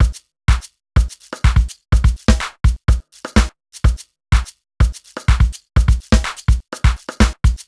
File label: top basic, 125 Beats